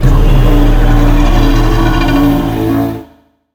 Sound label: Head hum; Fight Knight